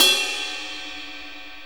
Bell Ride, Cymbals; Sonic Foundry (Sony Creative Software); Drum Tools

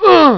hurt; Amiga Soundtracker Sample Packs

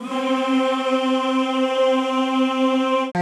CHO C3; Sound & Vision; Gigapack I CD 2; CHO Zzzz + Vvvv; CHO Vvvvv

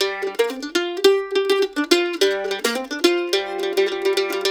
Blues Guitar Samples, lick

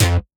Stab; neuro; Optiv & BTK

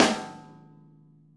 VINT KITS; Sonic Reality - Vintage Timetraveler

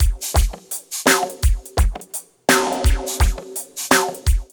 DRUMLOOP, Ragga & Dub Reggae

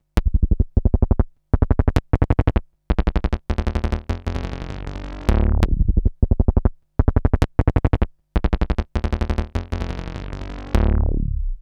SYNTIX BASS, BASSLINES 175; Masterbits - Tekkno Trax

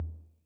Tom Low Min Decay, 808

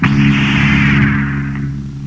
LASER; East West - Global Sounds; STARS FXS

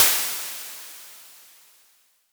Crashes; LIMAT Div Dept; Drums

